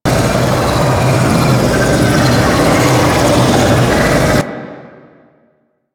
Gas Jump Pad Loop; Ambience; Props; Warframe; Corpus Gas City

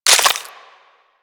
Reload C; Kuva Hek; Weapons; Warframe

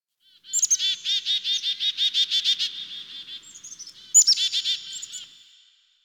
Bird Chickadee; Warframe; Ambience; Nineteen Ninety Nine

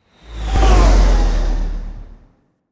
Rail Jack Fly By; The New War; New War Intro Moon

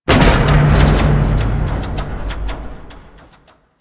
Void Dragon latch on; Enemies; Warframe; Duviri

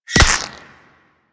Ghost Expel Spirit Impact

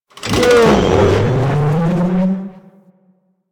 Thumper Thump Warning B; Warframe; Grineer Thumper Bot

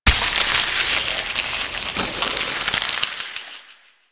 miasma impact; Warframe; Saryn

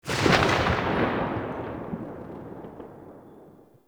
Scaldra Pistol Tail

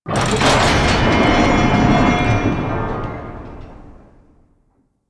Gate Door Open Start; Eidolon Ostron; Warframe; Ambience; Eidolon Plains

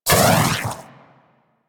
Armillary Sash Impact; Warframe; Warframes; Nezha

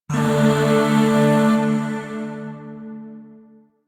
Shawzin Chromatic Chord C; Chords; Warframe; Zariman; Void

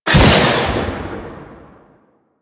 Shackle Cast; Warframe; The New War; Deacons

